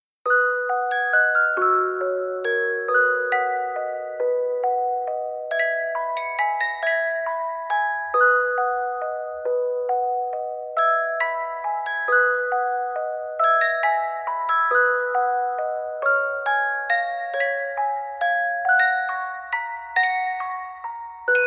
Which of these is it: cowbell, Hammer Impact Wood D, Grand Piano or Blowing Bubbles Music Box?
Blowing Bubbles Music Box